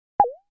cartoon drip; NCH Library

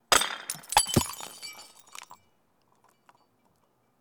Bottle Break, impacts; 1001 Sound Effects

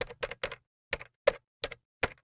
Telephone Dial; Communications; 1001 Sound Effects

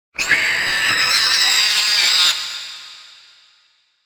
Giant Rat Squeel

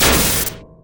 Scifi Dome Shield Impact; Energy & Forcefields

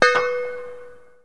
Baseball Hit Metal Pole

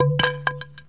Baseball Bat Fall

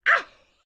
grandma knife waaa; Blind Drive

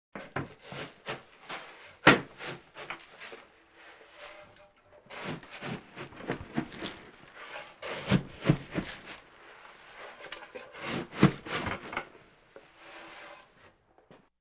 Animal effects snorts grunts farm ambience

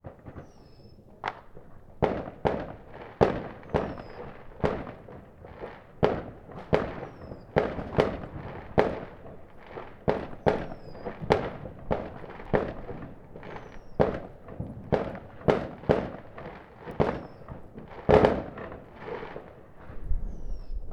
fireworks; ambience; NS Studios - ultra sound collection

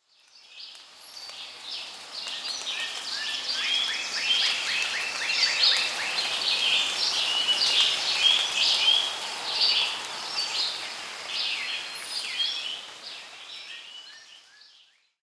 Monkey Crowd; Pitch Black Audio Pong

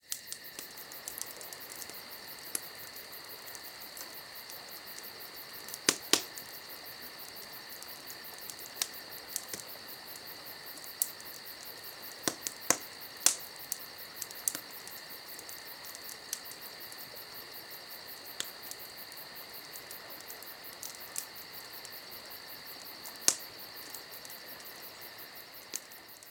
Night Camp, Ambience; iLife Sound Effects

